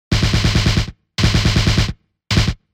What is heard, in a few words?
Assault rifle